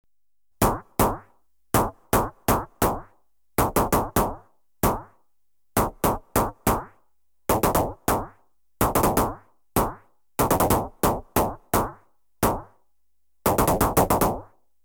Pump Action Rifle (Short Bursts), Electronically Generated Sounds; BBC Sound Effects Library